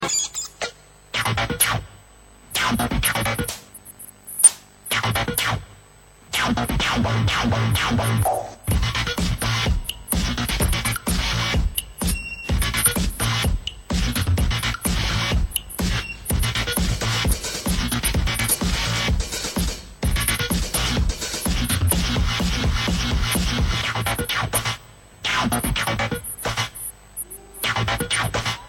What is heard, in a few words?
Cool Techno